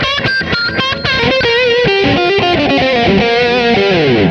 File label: guit lead; Sonic Foundry (Sony Creative Software); Ugly Remnants VOL-2